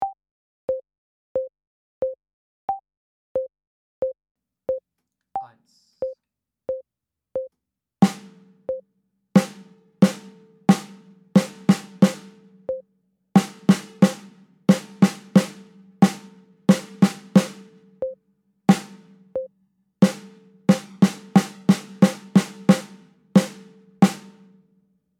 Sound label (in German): Duett Snare mit Click